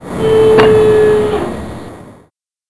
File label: heavy gunship select